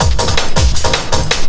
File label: LOOP L, Drum & Bass 160 BPM